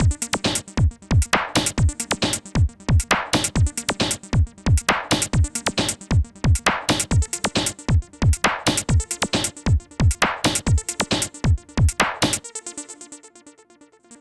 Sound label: Hardcore Breakbeat, 135 Gmin; Big Fish Audio; Hardcore Breakbeat Action; Ave A